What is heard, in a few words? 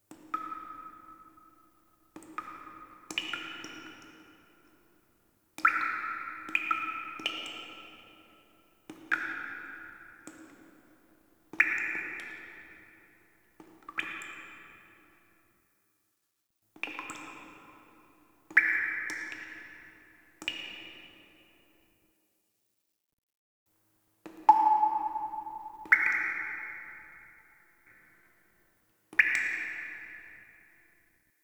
Cave Drips; Ambience; Duviri